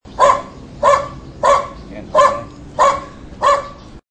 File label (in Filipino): pagtahol